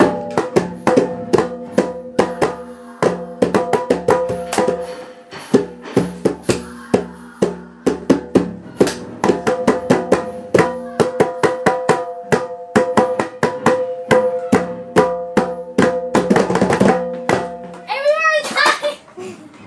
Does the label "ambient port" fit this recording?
No